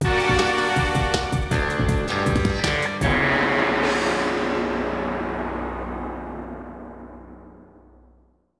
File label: Victory